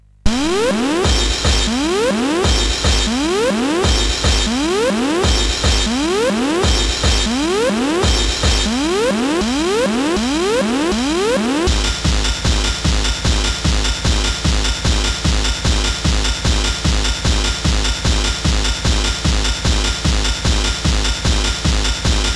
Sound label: techno, CSG8580R5; sid